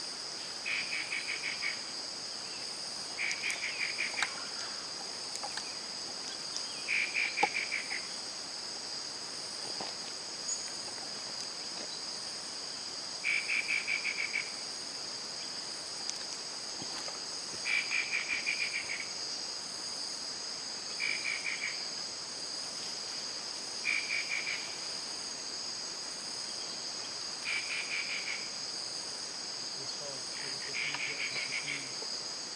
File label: Frederickena, پرنده فردریکنا